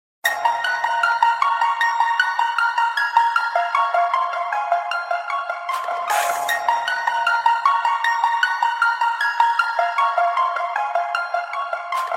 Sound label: Beat Hip Hop; UK Punjabi